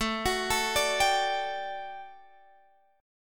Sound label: D-Major Add 11th A down Guitar Standard; arpeggiated; JGuitar